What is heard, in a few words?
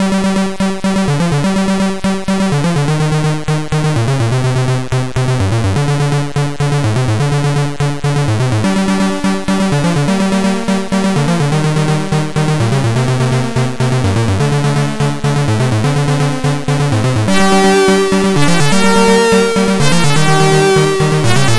c64 loader; ocean